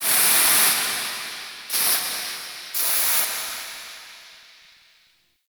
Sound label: Steam Blast; Warframe; The New War; Zariman